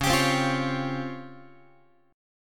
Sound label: Db Major Sharp 11th, Guitar; strummed